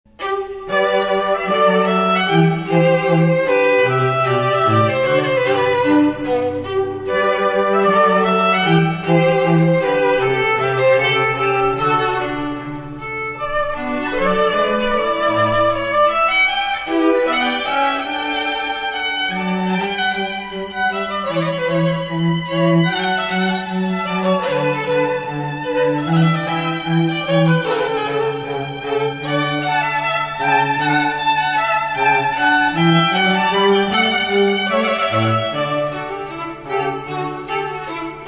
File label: String Trio; Pergolese Sonata 3; Cantilena South Florida